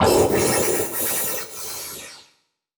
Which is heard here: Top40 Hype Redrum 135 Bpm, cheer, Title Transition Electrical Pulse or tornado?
Title Transition Electrical Pulse